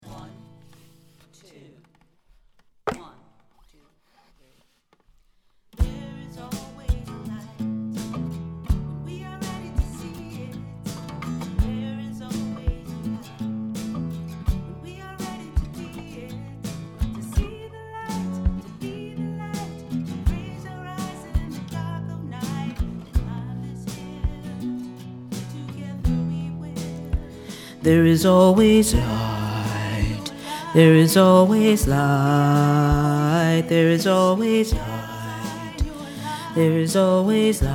BASS, choir; freedom choir

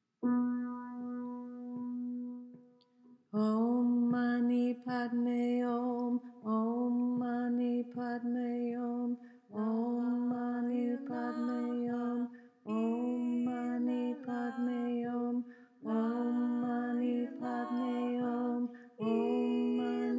Chant bass, choir

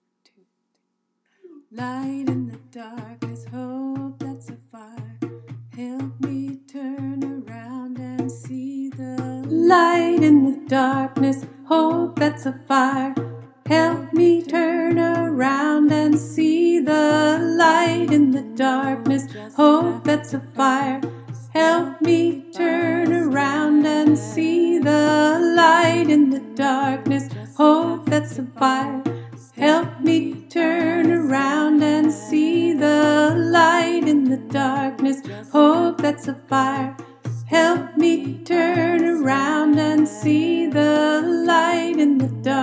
soprano, choir; freedom choir

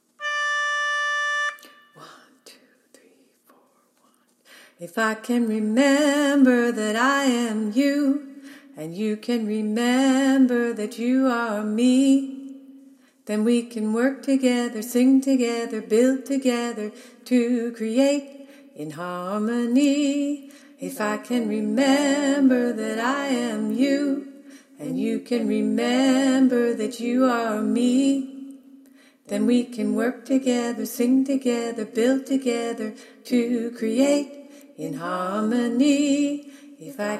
Alto parts, choir; freedom choir

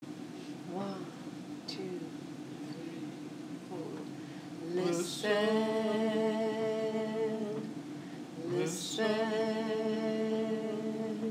alto

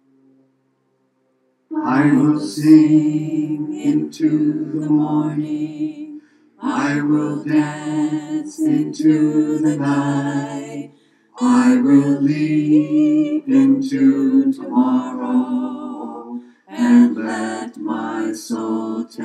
Bass, choir

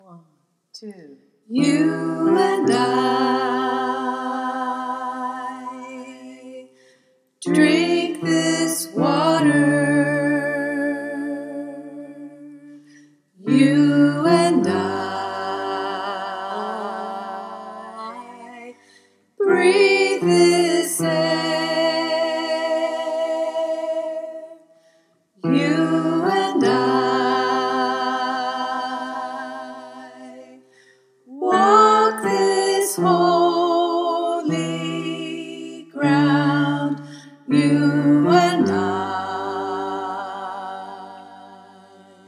Alto

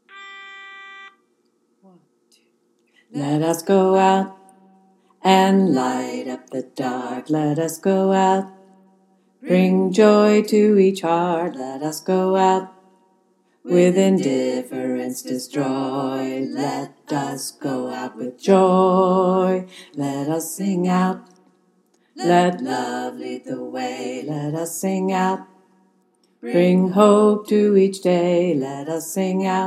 bass; freedom choir

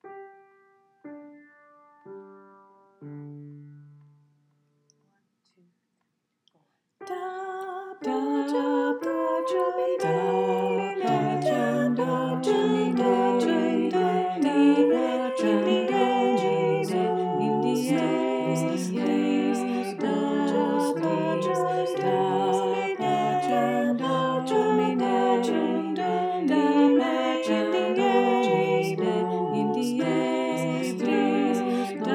Da Pacem Domine All parts, choir

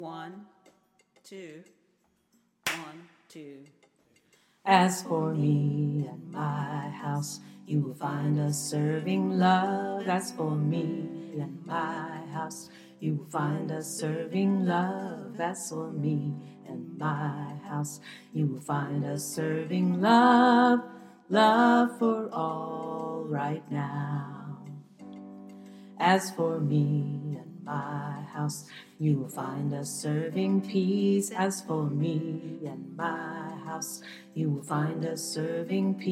Tenor, choir; freedom choir

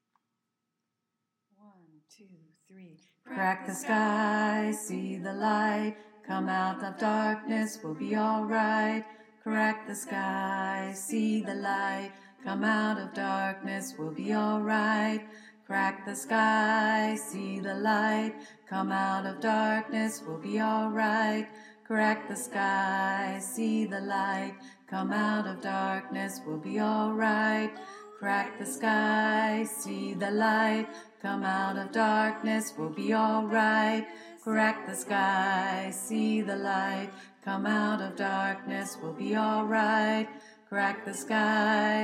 Tenor, choir

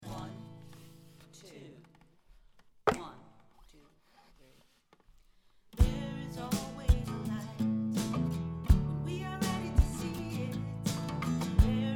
TENOR, choir; freedom choir

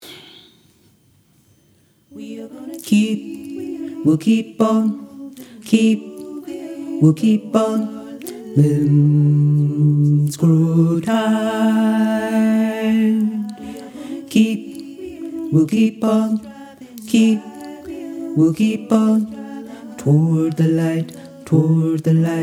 Bass; The Freedom Choir

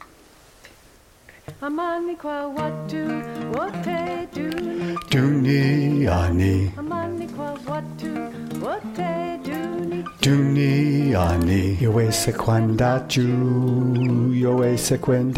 BASS

